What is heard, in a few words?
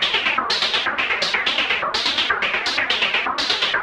Juno-60 Arp 125BPM